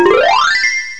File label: botan